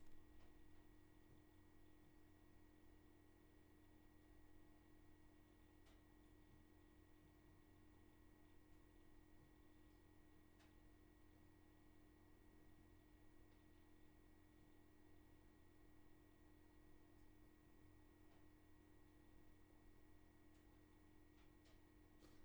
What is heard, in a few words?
room tone